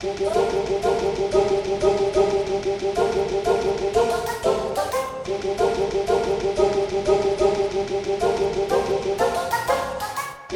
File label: battle; Gachitale